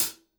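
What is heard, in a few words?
Hi-Hat, Drums Acoustic; USB Soundscan vol.10; AKAI; 90s sample CD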